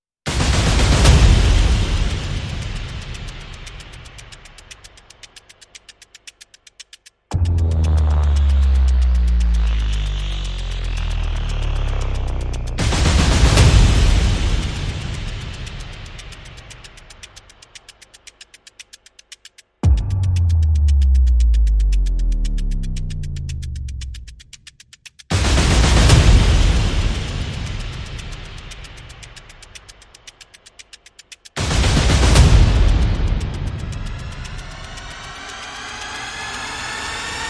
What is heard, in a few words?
Perc And FX Only